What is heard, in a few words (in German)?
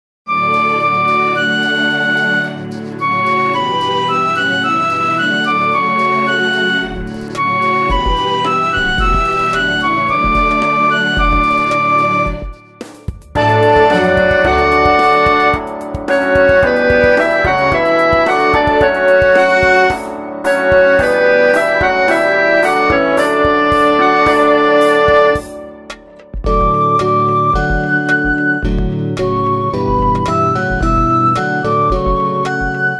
keyb